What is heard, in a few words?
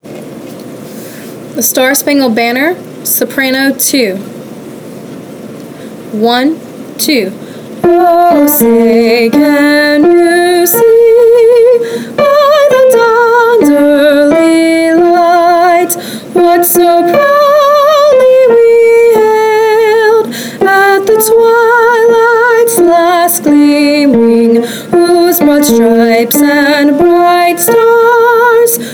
the star spangled banner soprano